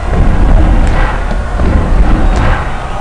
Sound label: stomp; PC Games.EXE November 1997; Legend98